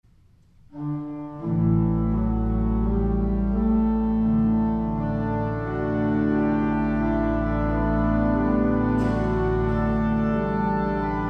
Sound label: Organ; St. John's Cathedral, Jacksonville Florida